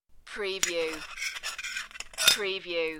Tin Lid Countr

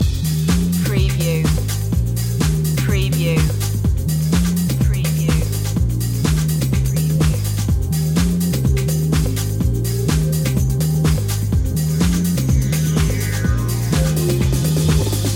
trip minimal loop

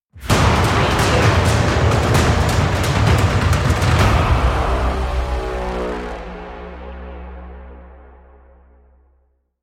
industrial impact stinger

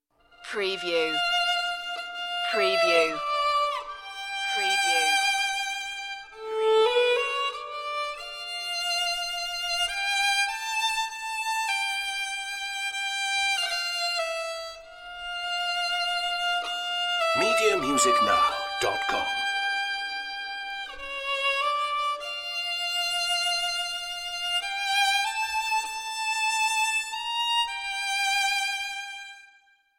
solo violin only

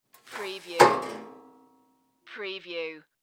Folding Chair Open

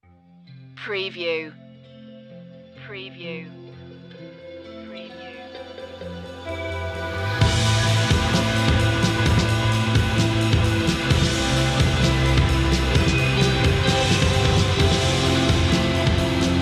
wind

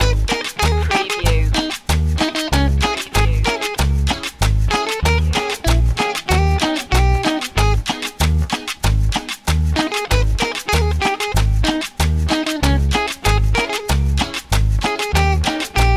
colombian party loop

